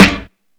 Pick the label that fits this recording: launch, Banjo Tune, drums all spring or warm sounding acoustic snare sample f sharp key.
warm sounding acoustic snare sample f sharp key